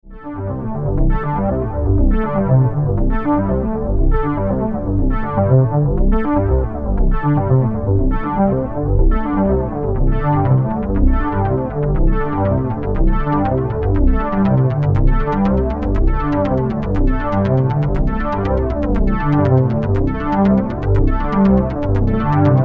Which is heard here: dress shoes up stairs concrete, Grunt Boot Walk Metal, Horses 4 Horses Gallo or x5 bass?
x5 bass